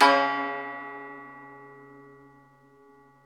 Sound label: SU GZHENG, Earth Tones